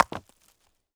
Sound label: IMPACT Stone On Stone Subtle mono; Phasmophobia